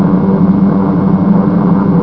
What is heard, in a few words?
eng; BOMBER III™ (DEMO)